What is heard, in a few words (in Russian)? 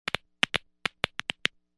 hrustjat kostjashkami palcev